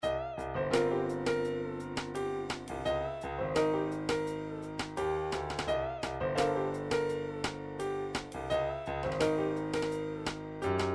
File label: triphop; Newtronic Triphop Construction Kit